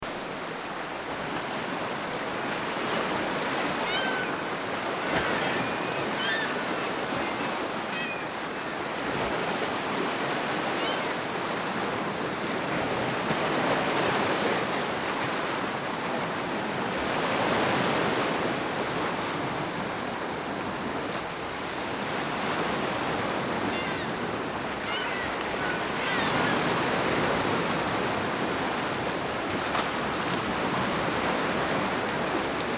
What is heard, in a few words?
Ocean